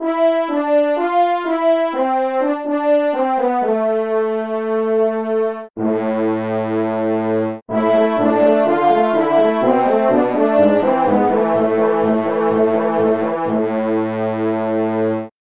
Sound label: french horns